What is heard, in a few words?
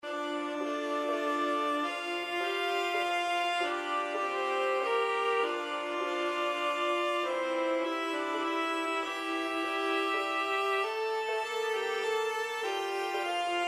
more folkish